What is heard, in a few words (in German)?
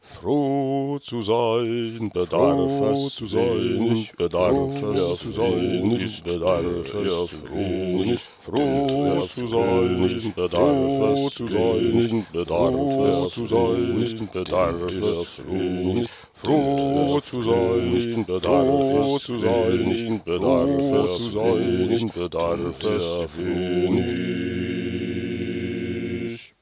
Froh zu sein Kanon